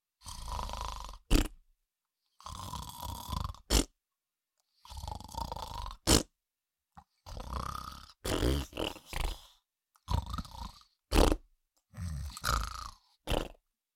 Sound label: Cartoon little monster voice snore snoring